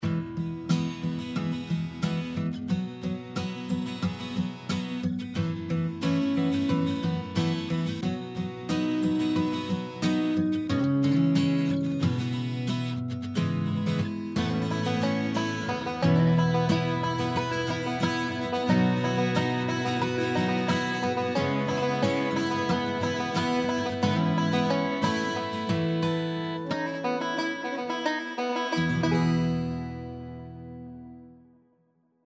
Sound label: BANJO COUNTRY SUITE